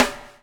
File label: BUBL, Backbeat; Spectrasonics - Backbeat